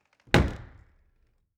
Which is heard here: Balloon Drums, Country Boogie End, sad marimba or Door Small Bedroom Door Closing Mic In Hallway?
Door Small Bedroom Door Closing Mic In Hallway